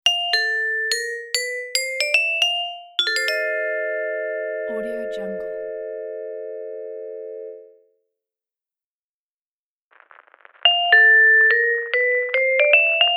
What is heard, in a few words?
classic jazzy vibraphone